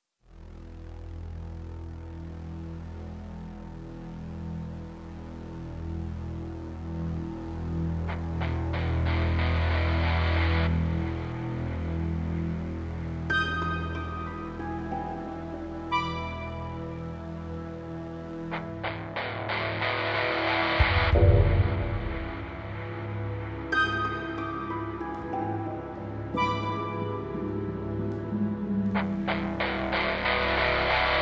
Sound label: orch drama guitar; soundtrack